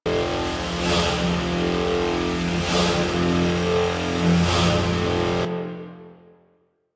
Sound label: Coolant Machine Loop, Ship; Ambience; Props; Warframe; Corpus Ship Remaster